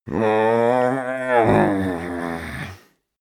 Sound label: male growl wake, koerpergeraeusche; soundpool